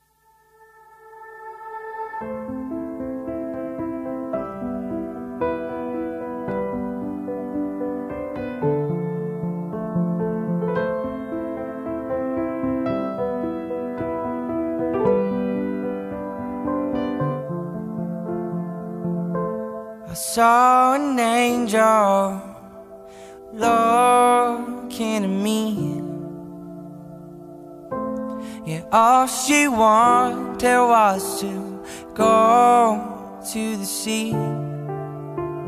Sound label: Acoustic